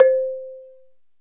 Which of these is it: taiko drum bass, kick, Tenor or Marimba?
Marimba